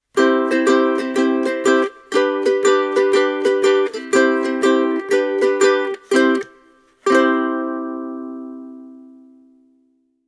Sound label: concert ukes miked